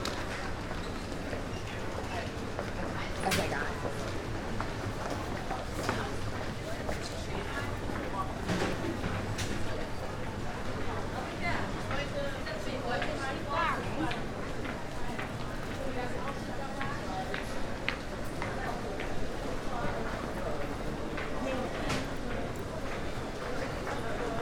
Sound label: BUSY STREET underground path morning swarm metro rush New York USA